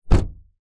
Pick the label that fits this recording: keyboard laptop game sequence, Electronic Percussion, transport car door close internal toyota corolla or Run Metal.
transport car door close internal toyota corolla